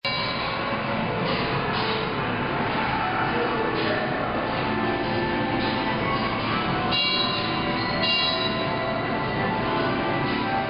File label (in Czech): hra s micky; japan